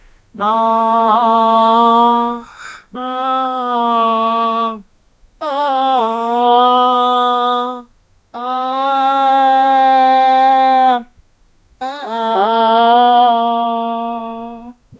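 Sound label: man with buggy voice singing, aah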